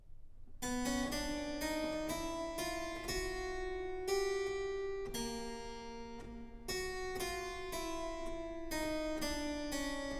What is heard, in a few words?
Canon a 2 (harpsichord solo); Bach; Musikalisches Opfer BWV 1079; Quaerendo invenietis